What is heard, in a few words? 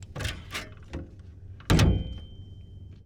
1927 Sedan Back Door Open Close; Period Vehicles